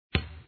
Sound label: bounce, Basketball; Sports Games; BasketballPractice